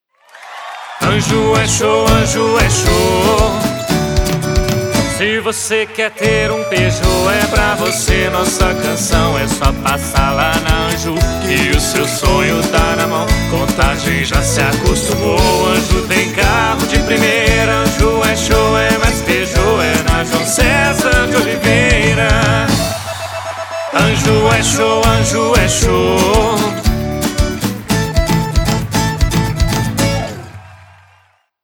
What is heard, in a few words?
jingle; anjou